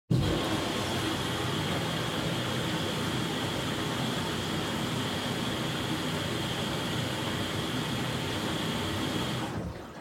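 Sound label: Washer Front Load Operation Noise Pour Water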